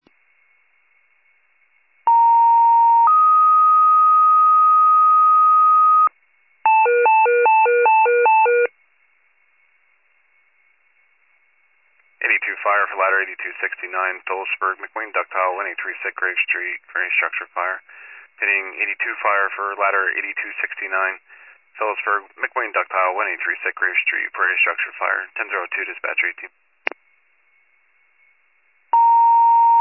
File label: FIRE pager alert